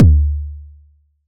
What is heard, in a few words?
Toms Medium